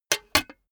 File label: cash box metal lid close hard; maschinen; soundpool